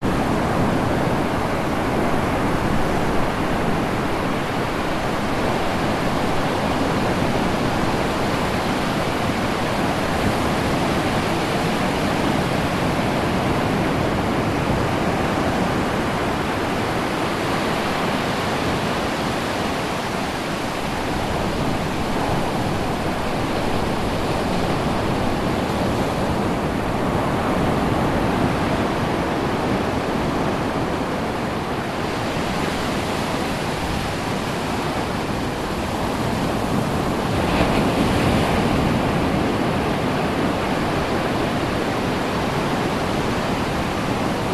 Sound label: nature ocean waves break high tide sandy beach med close; zapsplat